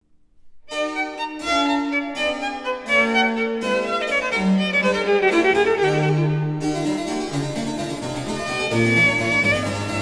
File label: For Violin And Basso Continuo Presto; otgoloski hora